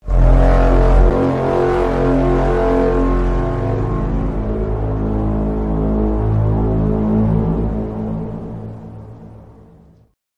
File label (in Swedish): luffarenhorn